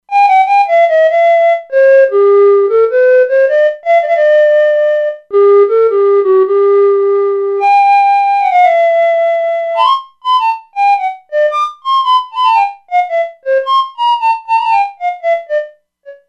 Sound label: Recorder; Factory Presets; E-mu Proteus-2